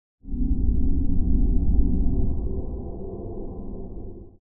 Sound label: air movement breeze; sonniss gdc